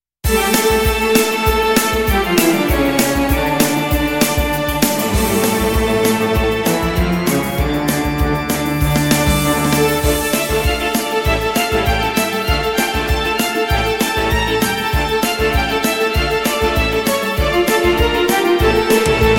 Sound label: toccata quickstep